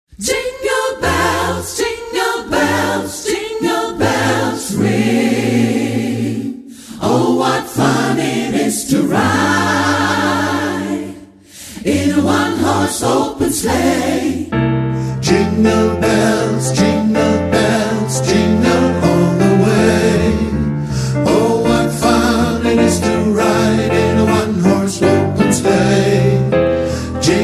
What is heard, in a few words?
Jingle bells